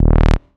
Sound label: Synths C; Vengeance Samples